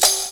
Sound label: DR. LOOP; X-Static Goldmine 3